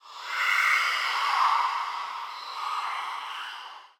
heartbeat; superstriker

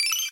count up loop end; superstriker

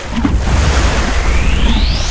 Big Win Intro; aloha

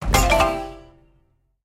explode wild; serengetikings

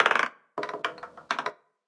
ball Bounce, roulette

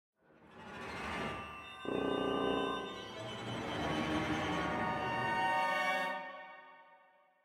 dissonance; the wolfs night

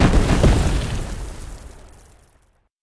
first Explode; pyramid

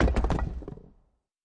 avalanche Block; pyramid

